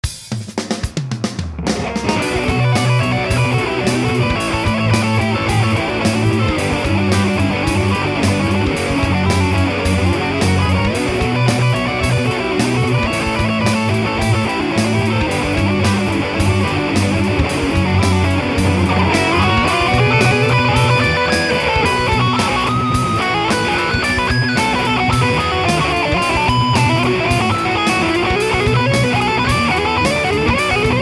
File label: metaler